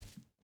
footstep burlap wood walk; Rust

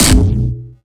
biomech blocker shield bullet

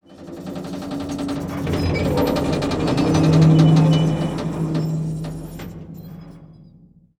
Amb Constructions; Red Orchestra 41-45

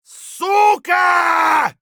fight; Escape from Tarkov

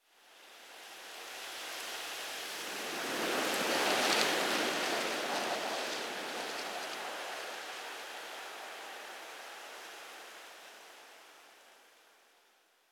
wind leafy whistle; ModernWarfare

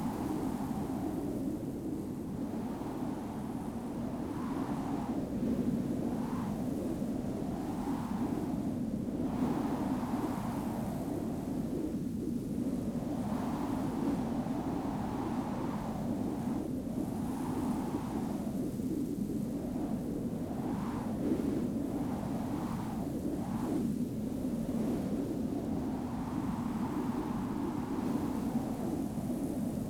ambience arctic; Rust

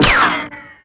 rico wood, ricochet; Modern Warfare

